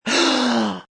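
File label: Gasp Long male, Gasp, male; human voice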